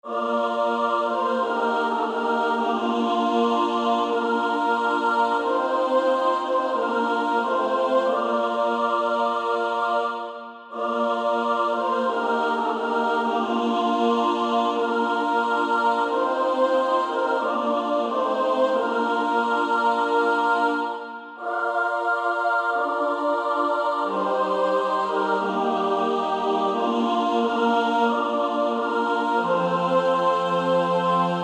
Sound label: Female Choir; Pass me Not O gentle Savior; SSAA